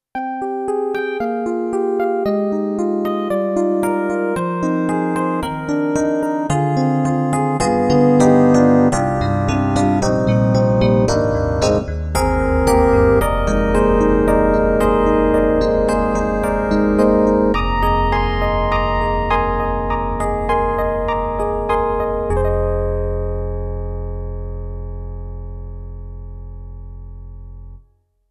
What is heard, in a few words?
Yamaha MU90 Velocity Crossfade Electric Piano 2